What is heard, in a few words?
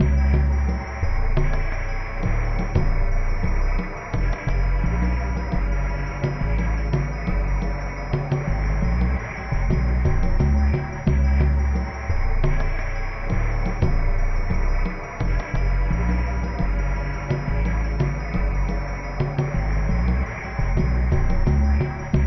Bgm slow